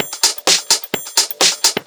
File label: Straight Loop 128 BPM, House